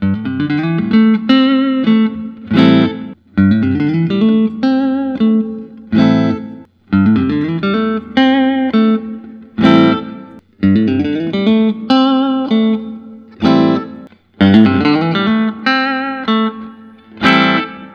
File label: Larrivee USA; santa monica